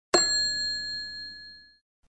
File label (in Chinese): 成功